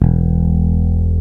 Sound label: BASS E2, ELEC BASS; East West - Global Sounds